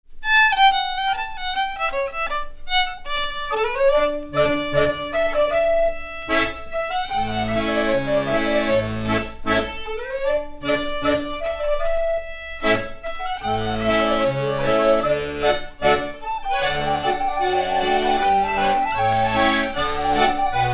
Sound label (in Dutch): Horlepiep